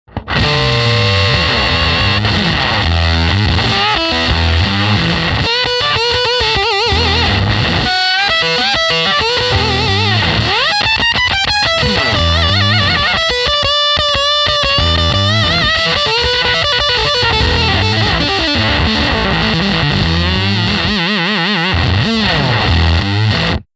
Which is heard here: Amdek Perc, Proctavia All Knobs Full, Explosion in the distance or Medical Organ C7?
Proctavia All Knobs Full